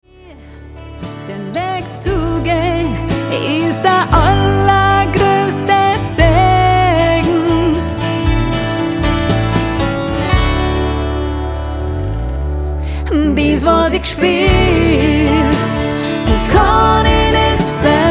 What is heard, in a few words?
unplugged